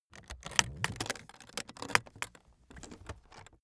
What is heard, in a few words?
aluminium case open close